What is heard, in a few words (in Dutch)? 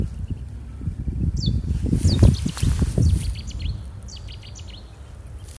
cettis zanger opname; frank wagemans